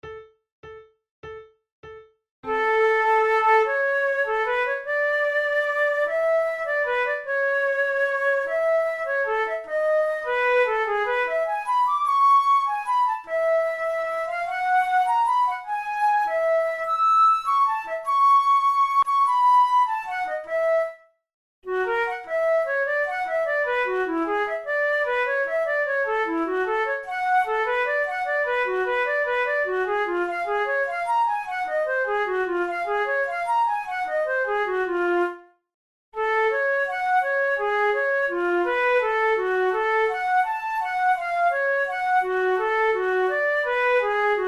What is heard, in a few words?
duet in a major; kohler op93 no1 duet no9